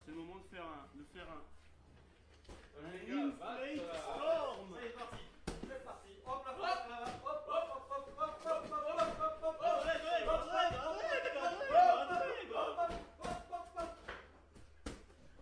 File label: vinyl storm